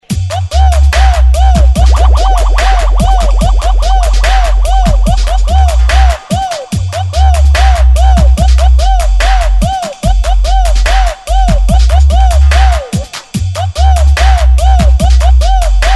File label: Everybody Pom Pom Dubstep Mashup; remixplanet